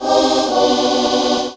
lose; Game Maker 8

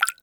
FX Drip; FX Hit; Drums; Ableton Live 12 Lite; Core Library

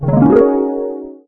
harp sweep; There; ThereClient

